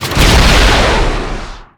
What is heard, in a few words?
Rocket Launcher Shot; SFX Kit; Weapons